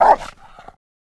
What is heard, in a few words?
Rottweiler Vicious